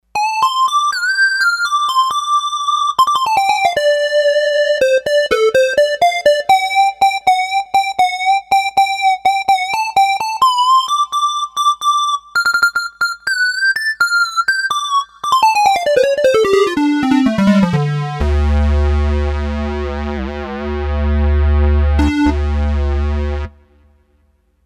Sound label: Square-Wave Lead, Roland D-10; Factory Patches